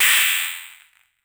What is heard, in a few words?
sharp electronic clap, percussive